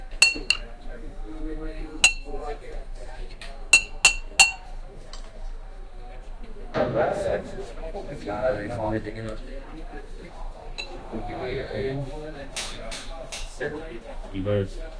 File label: ice clinking in a glass